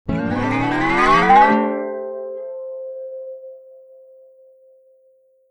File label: Electric guitar scraping on strings